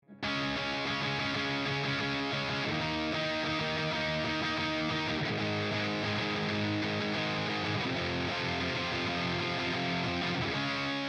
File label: Guitar Dry